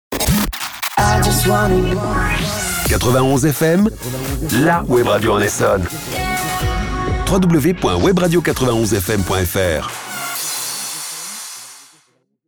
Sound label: Sweep ID; 91 FM; Essonne